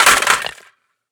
riffle drop tile; STALKER; IWP

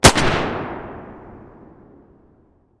beretta muzzle mono distant, weapons

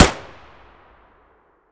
akm muzzle distant, weapons; ak103; STALKER; IWP

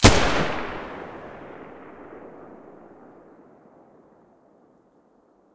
muzzle g36 stereo, weapons

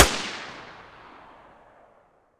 muzzle p220 mono distant, weapons

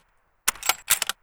dvl bolt, weapons; dvl10

